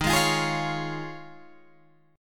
Eb 9th Suspended 4th, Guitar, Open G

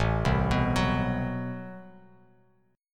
A Minor 6th Add 9th A Bass Standard, Chord; Am6add9 arpeggiated; JGuitar

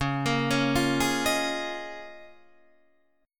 Db 6th Add 9th Db, down, Guitar Open D

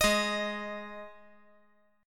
G sharp 5th G sharp; strummed; JGuitar

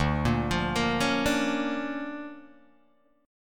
D Minor Major 7th Sharp 5th, D, Guitar, Open G

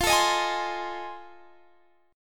F 7th Sharp 9th F Guitar Standard; strummed; chord; F7#9; JGuitar

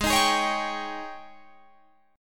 G sharp Major 7th Suspended 4th, Guitar, Drop D; 18,18,18,18,20,21

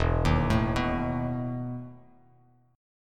E Major 7th Suspended 4th Bass Standard; EM7sus4 arpeggiated

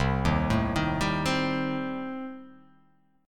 C Minor 6th, chord

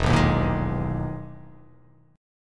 E-Major Sharp 11th E

